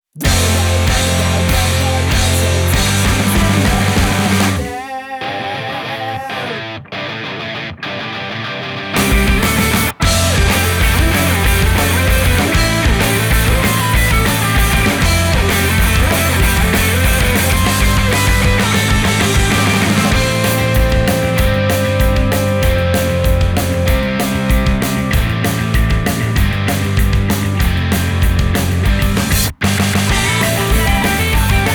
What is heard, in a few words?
Instrumental; Gintama